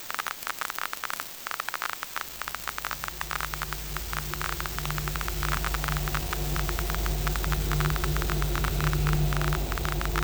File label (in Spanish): fuego